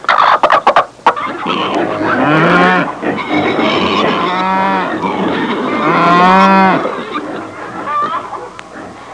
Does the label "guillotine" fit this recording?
No